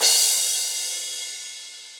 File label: Crash 16 Cold Tight Room, Cymbal; Drums; Drum Hits; Standard Hits; Session Drums Studio